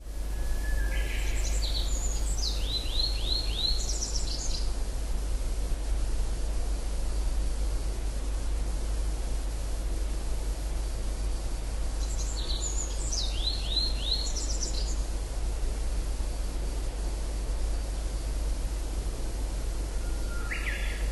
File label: tree pipit